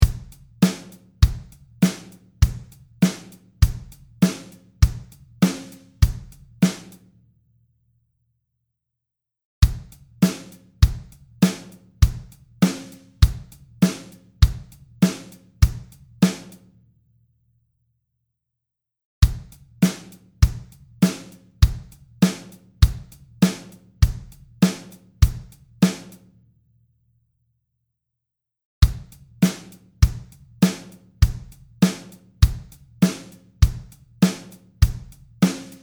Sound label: Drum machine 4:4 straight 100 bpm